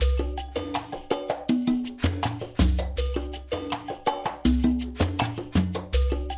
funk percussion loop; InVision Interactive; Percussion Slam

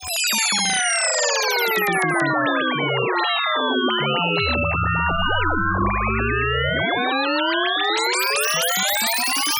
random sines

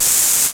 MPC hihat open; MPC Electronics The KIT

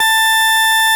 FARFISA, ORGAN; Sonic Reality; Vintage Timetraveler

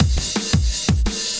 Slither 170, Drum&Bass Breaks; EZ Rollers

